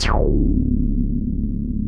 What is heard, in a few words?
DEEP BASS; Masterbits; Dance Trax